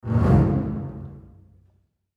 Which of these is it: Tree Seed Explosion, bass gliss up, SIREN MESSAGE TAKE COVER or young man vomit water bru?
bass gliss up